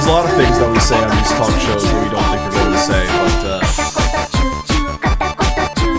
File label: hehehehe